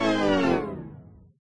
login failure; There; ThereClient

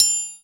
Triangle Synthetic; One Shots; Drums; Ableton Live 12 Lite; Core Library

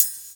Triangle Build; One Shots; Drums; Ableton Live 12 Lite; Core Library

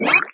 take out piece; There; ThereClient; gamekit

